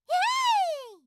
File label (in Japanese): 攻撃